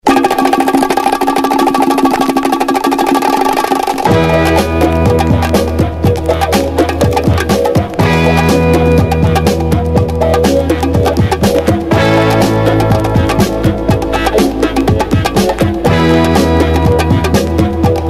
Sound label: DISCO